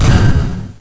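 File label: switch on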